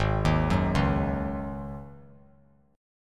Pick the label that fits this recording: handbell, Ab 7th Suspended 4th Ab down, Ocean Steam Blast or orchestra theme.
Ab 7th Suspended 4th Ab down